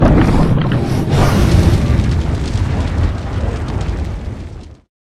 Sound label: fire breath, dragons